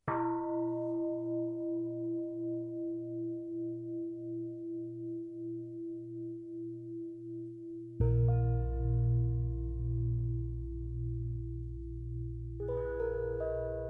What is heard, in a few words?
Gong blues